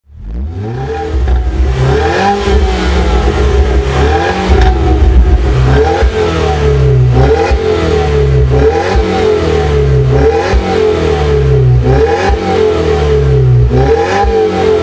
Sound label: blip